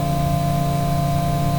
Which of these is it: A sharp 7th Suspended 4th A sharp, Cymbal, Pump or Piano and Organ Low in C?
Pump